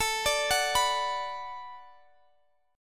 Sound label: B-Minor